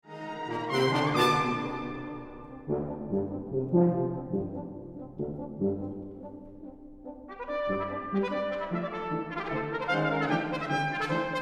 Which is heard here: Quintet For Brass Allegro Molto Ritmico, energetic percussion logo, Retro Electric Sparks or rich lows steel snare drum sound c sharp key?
Quintet For Brass Allegro Molto Ritmico